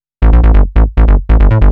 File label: TECHNO BASS 140 A